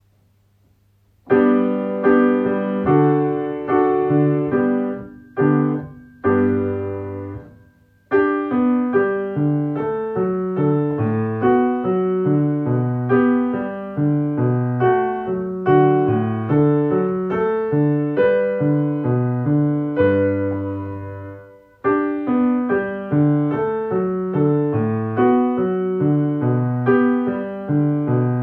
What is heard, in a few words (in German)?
leise Klavier